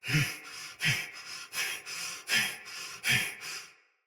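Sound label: Breath Soldier Mace Agent Female; Battle; Sinecure Melee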